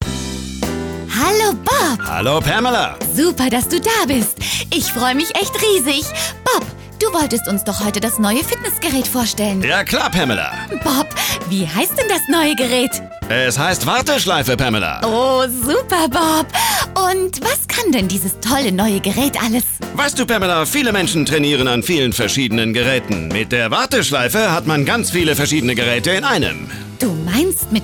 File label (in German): warteschleife; LEO